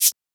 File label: Shaker